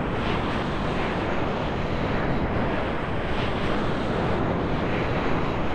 wind

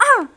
pain; angelyss

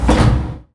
switches

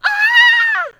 death; player

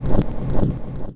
air out

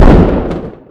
lightning fire, weapons

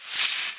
powerup respawn

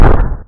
obelisk hit

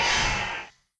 damage

